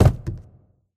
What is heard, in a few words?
phys cube, physics